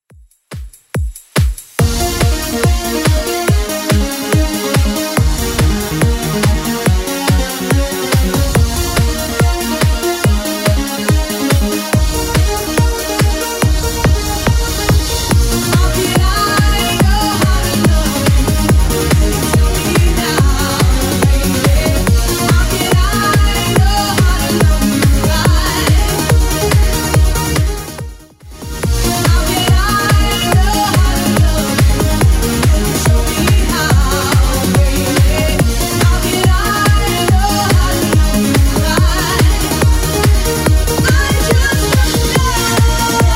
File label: 90s, 142bpm; Redrum